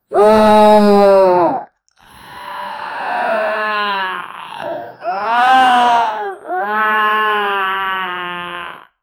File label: female zombie chasing a p, creature